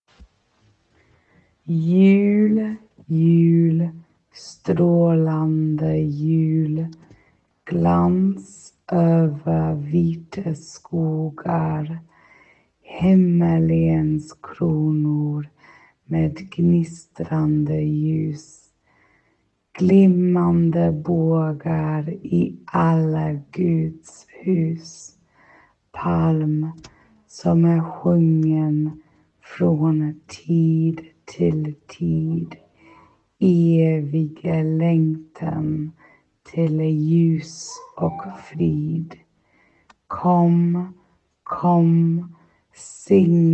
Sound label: slower